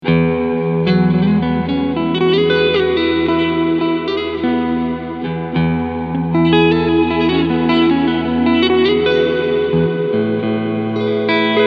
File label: GFI System Skylar Plate